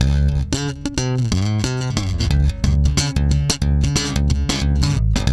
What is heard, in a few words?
SFNK D#, Bass, FUNK; Spectrasonics; Bass Legends